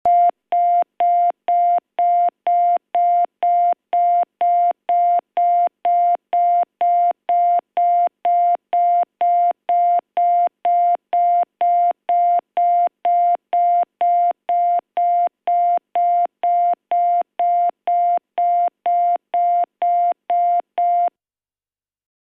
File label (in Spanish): sonido combi